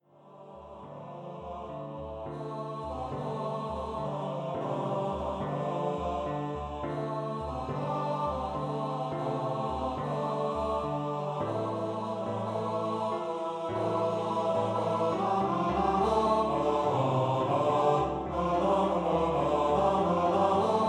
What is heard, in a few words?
2 part song for Male Voice Choir